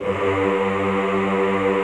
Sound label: EHH G1, Classical Choir; Best Service